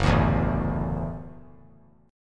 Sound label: F sharp Minor 7th Sharp 5th F sharp; strummed; JGuitar